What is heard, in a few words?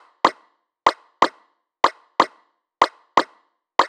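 Perc Loop, 123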